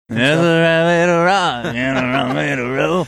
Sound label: singing theres right way to rock funny